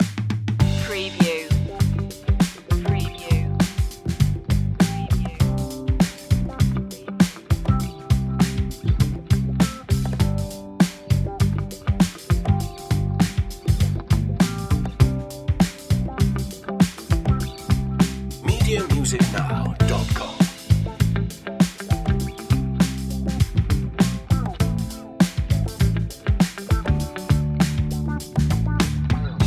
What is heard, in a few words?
easy funk comedy bed, 70s retro